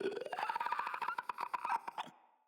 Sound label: Infested Runner Patrol